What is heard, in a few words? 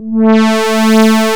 DIDI PAD, SYNTHESIZER; AKAI S6000 CD-ROM - Volume 1